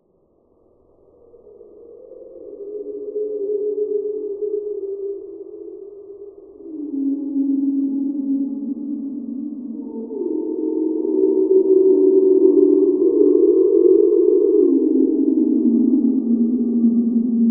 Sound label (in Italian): pettirosso; FILTRATI E RALLENTATI; I 7 CANTI